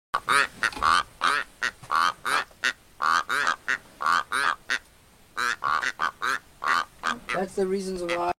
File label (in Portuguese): Canto do sisao do karoo